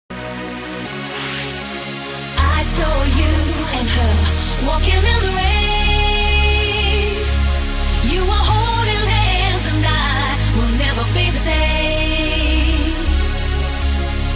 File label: uk bounce